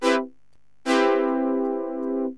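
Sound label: major 7th, synth chime; cubase; CUBASIS; SYNBRASS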